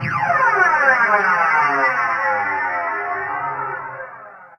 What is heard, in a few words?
SFX, synth atmos & fx; Dream Zone